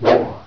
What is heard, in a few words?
sword Spacey, weapons